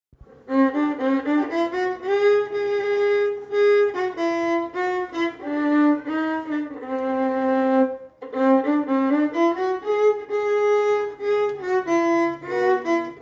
bgm in violin; punnagai mannan

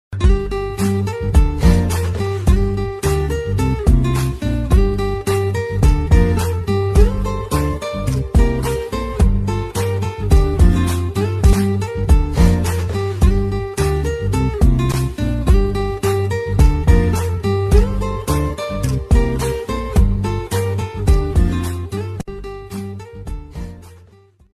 love ringtone; son of satyamurthy movie

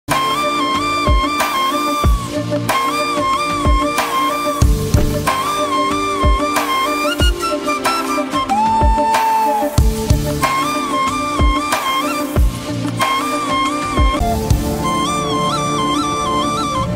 movie ringtone phone ringtone; raja vikramarka

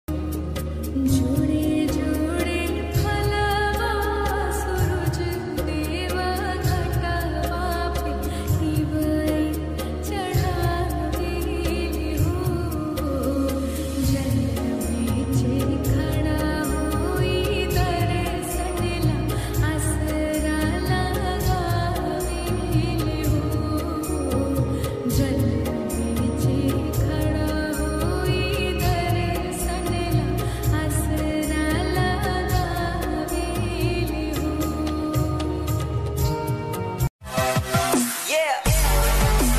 chhath song ringtone instrumental ringtone

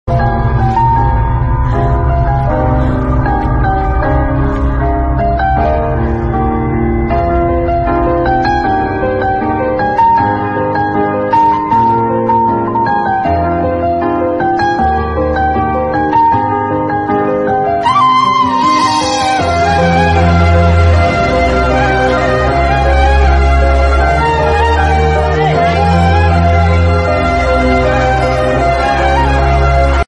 Sad Heart Touching Telugu Bgm

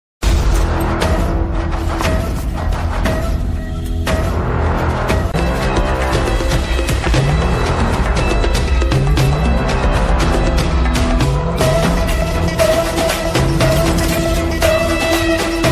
climax bgm bass boosted; Itlu Maredumilli Prajaneekam; Allari Naresh

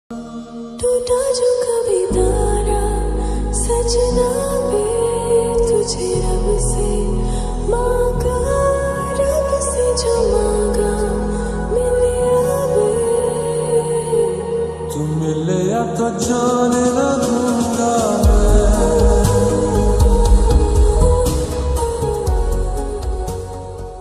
song ringtone slowed and reverb

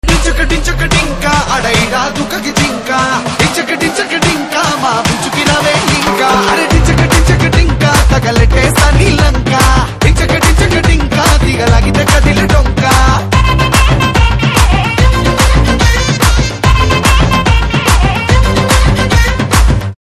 Ringtone; RED

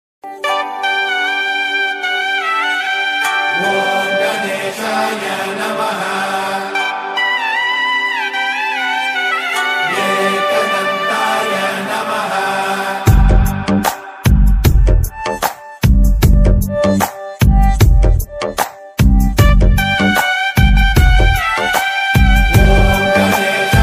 background music ringtone movie background music

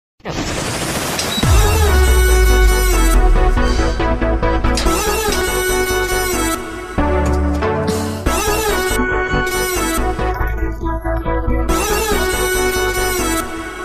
love bgm ringtone; a1 express; sundeep; lavanya

